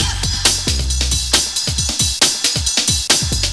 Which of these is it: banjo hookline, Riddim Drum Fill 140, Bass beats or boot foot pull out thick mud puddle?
Bass beats